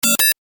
Tech beeps, Beeps, High Tech; Tech Sound Effects; Tech (Sound Effects:Science Fiction); Stuart Duffield

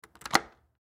Door Front Door, Door Front Door Lock; Door Front Door Unlock; Miscellaneous Doors; Household; Blastwave

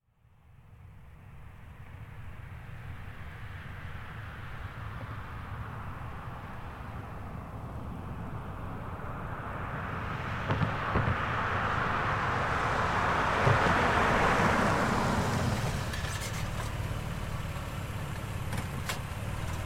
Car Highlander Up Stop Fast; 2005 Toyota Highlander V6; Cars; Vehicles

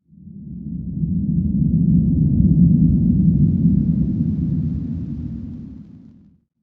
Beyond The Grave Rumble, ghost; horror sound effect

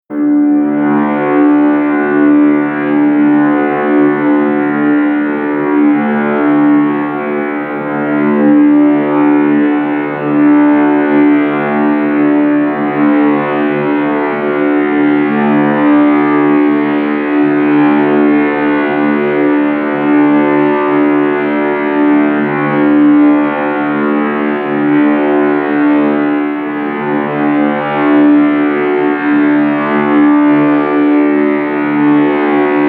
Laser Static Forcefield, sci-fi sound effect

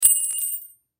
Car Keys Drop Hard Surface; Drops; Foley; Blastwave

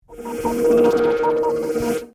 Scanner Beam By, Scifi; Science, Fiction; Tech; BlastWave

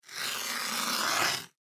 Ice Block Scrape Top; Weather; Ice Sound Effects; Part of Ice (Sound Effects:Weather); Blastwave

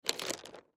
Pocket Knife Open; Pocket Knife Open Pick Up Rocks; Knives; Combat; Blastwave; Foley

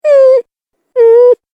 Cartoon Game Voice Unsure Sounds; Cartoon Voice Sound Effects

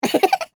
Voice Laugh Silly; Laughter; BlastWave

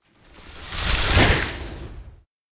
Skiing Snowboarding Downhill Slide By Fast; Skiing and Snowboarding

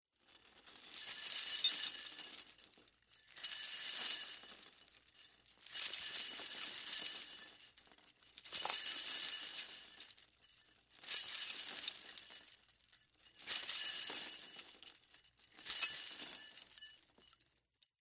Horror Chains Rattle Movement; Scary Soundscapes (Sound Effects:Horror)

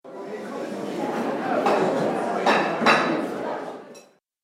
Hubub And Dining Noises In Restaurant; Restaurant Sound Effects; Andrew Fleming

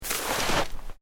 Footsteps Boots Snow Deep Step; Footstep Foley; Footsteps (Sound Effects:Human)

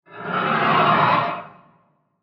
Scary Metallic Transition With Heavy Panning; Scary Soundscape; CraftedSignals

